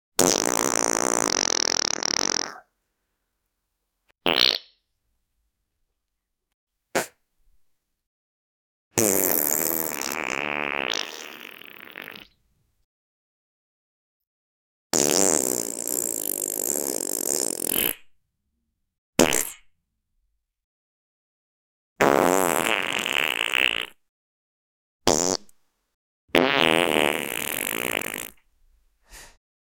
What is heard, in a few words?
farting; brawl stars